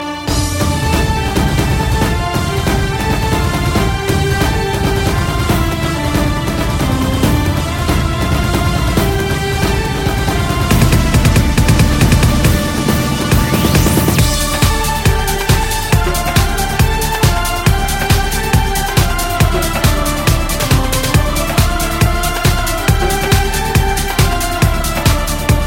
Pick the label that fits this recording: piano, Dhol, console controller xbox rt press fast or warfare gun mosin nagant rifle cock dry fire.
Dhol